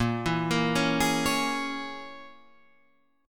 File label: C sharp Minor 6th A sharp